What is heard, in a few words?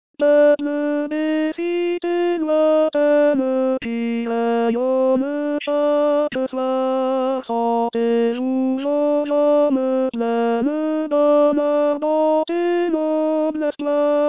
Tenor; HymneEurope